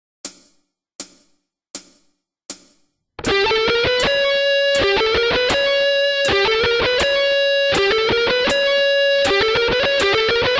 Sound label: shred